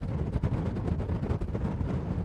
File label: wind; Blind Drive